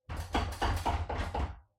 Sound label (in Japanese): 扉ガチャガチャ